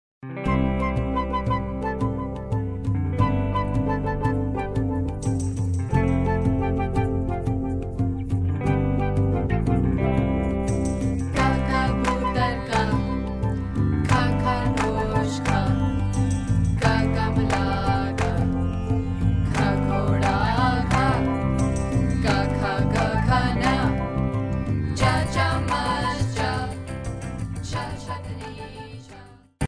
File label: Alphabet Melody